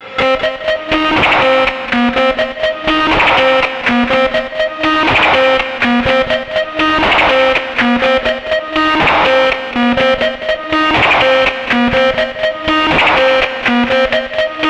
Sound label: C3, Synth Line; 90 s Sample CDs; NiceBeats; SynthLine VOL-13